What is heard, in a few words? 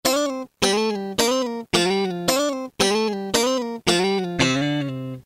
Oct Slide; Roland M-DC1